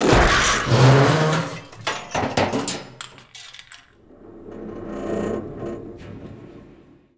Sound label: dog pick up van; hl2 ep1; ambient, levels, intro